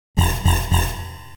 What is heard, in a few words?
store warning; Original TK Sounds